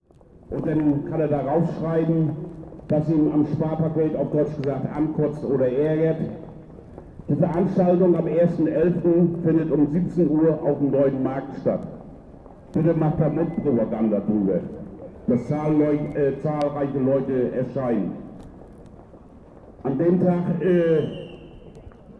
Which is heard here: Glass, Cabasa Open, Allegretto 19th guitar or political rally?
political rally